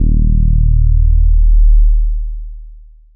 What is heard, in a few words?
Ju Bass, Bass One-Shots, Drum n Bass; KJ Sawka - Drum n Bass & Beyond